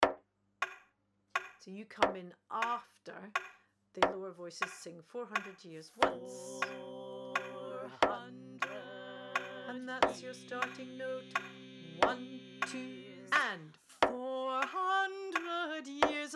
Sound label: Song VERSE Voice TUNE click; Voice 1